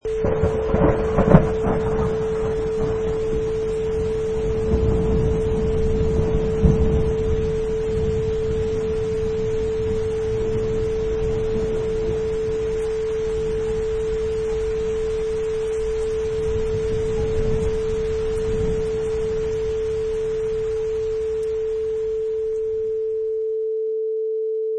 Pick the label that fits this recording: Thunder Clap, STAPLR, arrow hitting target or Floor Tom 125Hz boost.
Thunder Clap